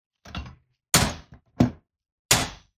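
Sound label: metallic mats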